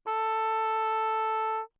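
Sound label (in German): trumpet, without mute; Bach; ohne; Perinétventiltrompete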